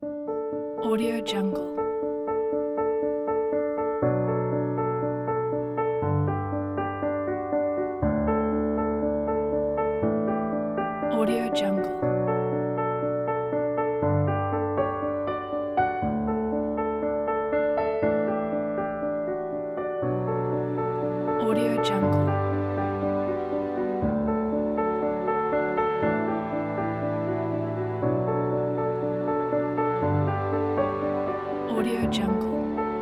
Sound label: inspirational piano